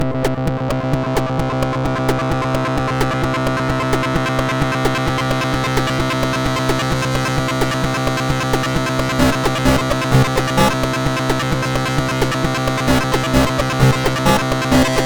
Pickup, MOS6581R3

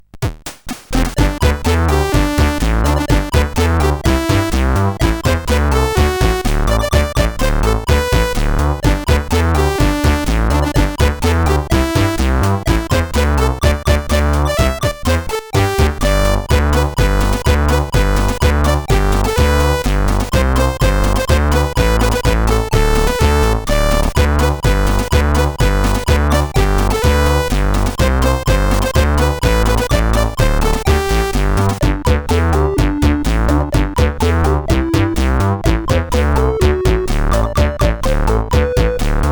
Jingle Bells, sid, CSG8580R5

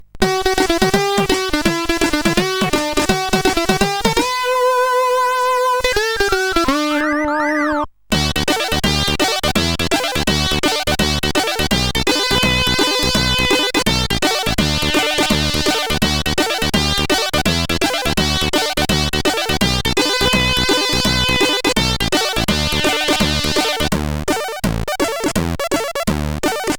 Birthday, CSG8580R5

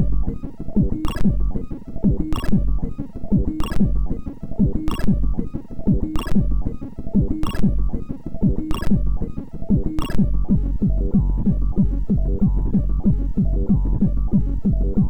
Hard Techno, MOS6581; MOS6581R4

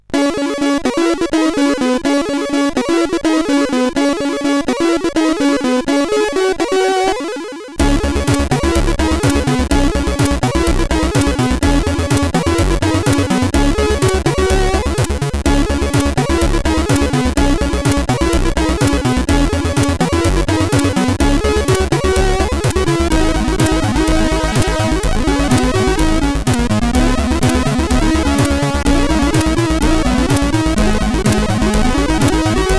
sid, CSG8580; hvsc

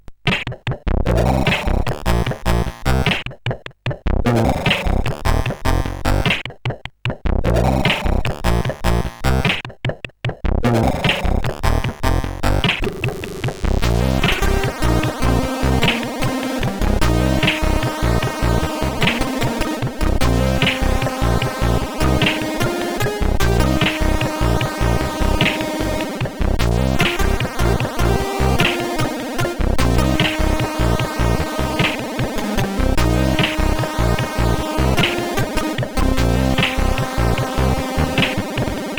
One Knight Stand, CSG8580R5

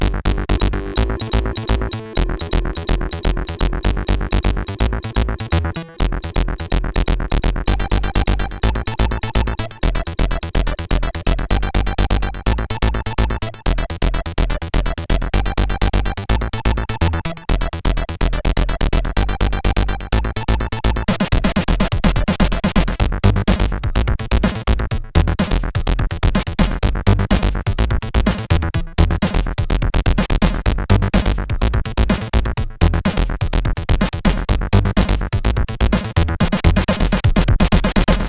tune CSG8580R5

